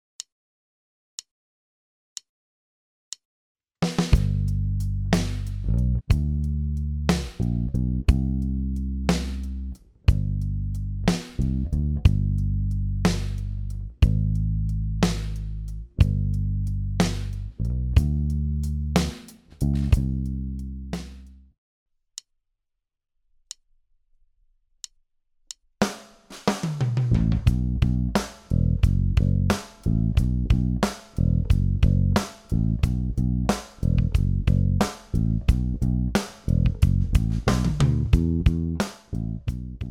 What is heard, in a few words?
Blues Blues Rock